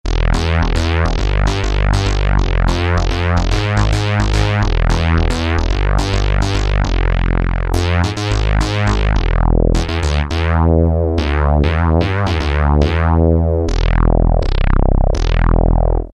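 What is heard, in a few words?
Bass Fat Saws, E-mu Morpheus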